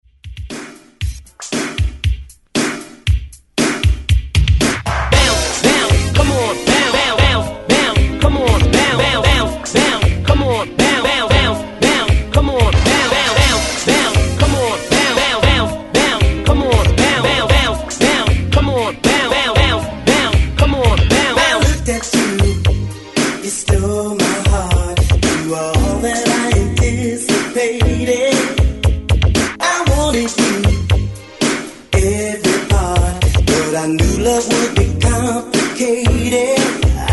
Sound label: 80s Hype Redrum 116 Bpm; DJDOLLS